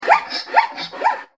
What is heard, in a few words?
zebra